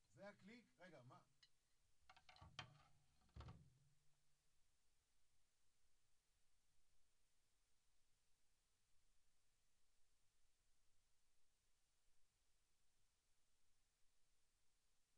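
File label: Floor, drums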